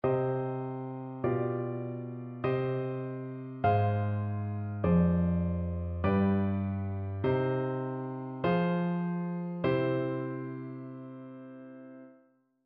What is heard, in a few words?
harmonic error detection